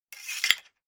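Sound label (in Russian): клинок в ножны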